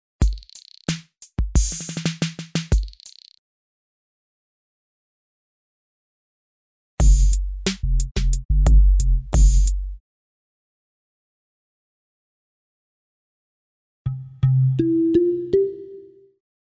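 medium rhythm percussion three sets of endings short musical transition logo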